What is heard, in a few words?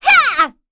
Vox Attack; Pansirone; kingsraid